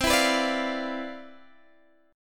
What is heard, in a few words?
C Major 11th, Guitar Open D, chord